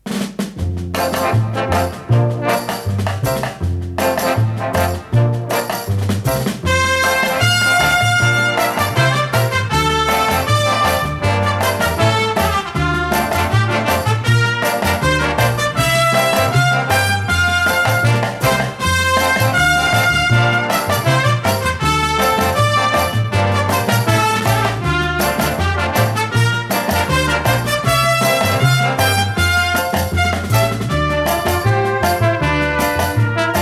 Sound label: Progressive Dance Orchestra; De Wolfe Music